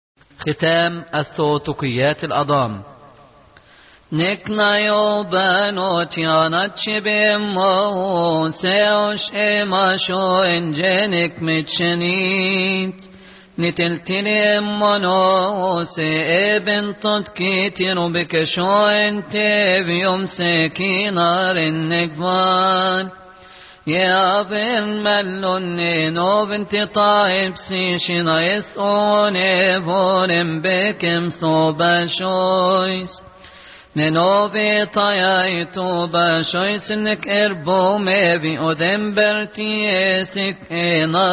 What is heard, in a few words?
Alternative Tune; praise adam theotokia conclusion; Unknown Deacon